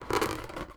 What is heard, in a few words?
squeak; Politburo